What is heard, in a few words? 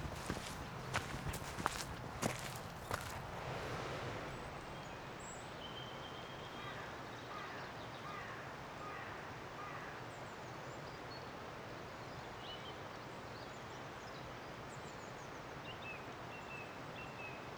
woodland birds